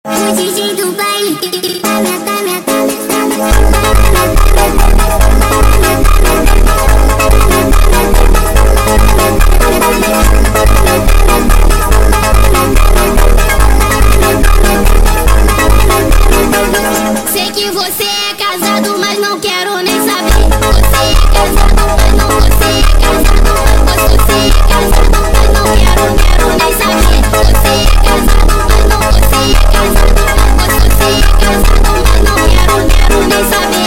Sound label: speed Up